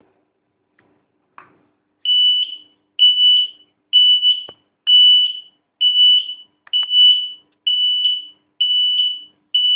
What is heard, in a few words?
220v Buzzer